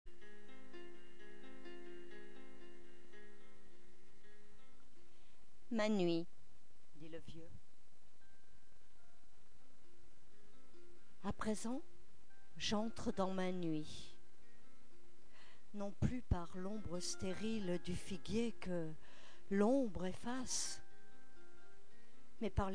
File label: piano